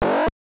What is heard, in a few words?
damage; samus; metroid